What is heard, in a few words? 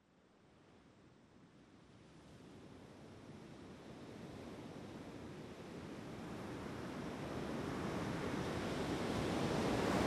Pacific Surf & Songbirds